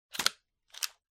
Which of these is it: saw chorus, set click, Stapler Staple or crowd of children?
Stapler Staple